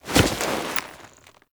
Kuva Lich Finisher Foley; Warframe

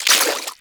Collectible Pickup; Inf Eris; Warframe; Pickups

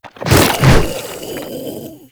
Infested Ancient Strike Flesh; Warframe

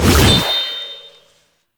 Scythe Whoosh, Weapons; Stalker Jade Scythe; Warframe

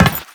Run Metal, Footsteps; Warframe; Teshin

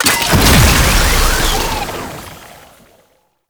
Artifact Activation; Gameplay; Hellscrub; Warframe; Nineteeen Ninety Nine; Deep Archimedia

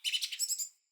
Bird Song; Josalfar Bird Guide

